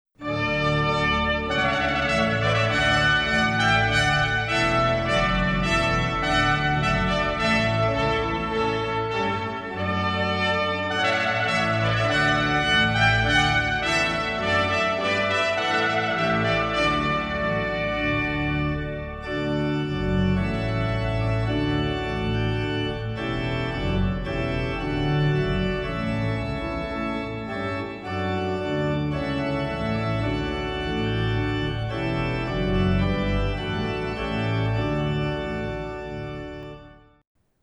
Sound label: Trumpet Voluntary